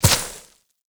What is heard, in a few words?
Ram Movement Start; Animals; Duviri